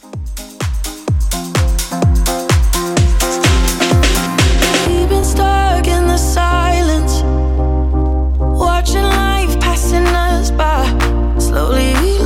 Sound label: Club Extended 127Bpm